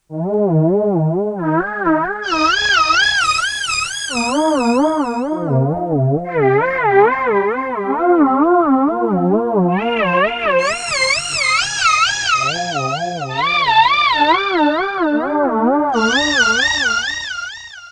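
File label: synth bounce, analog polysynth; Solton SM100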